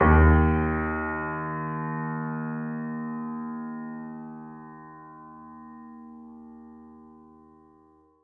piano f d#2, Grand Piano; Sonatina Symphonic Orchestra

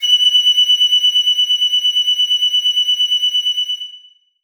piccolo f#6; Sonatina Symphonic Orchestra

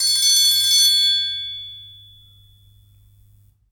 triangle roll; Percussion; Sonatina Symphonic Orchestra

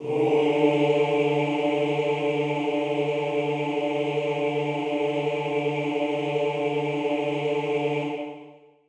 chorus male d3; Sonatina Symphonic Orchestra

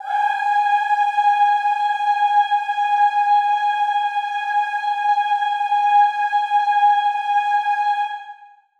chorus female g#5; Sonatina Symphonic Orchestra

